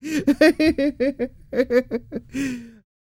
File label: LAUGHS; Spectrasonics; Vocal Planet; Jazz FX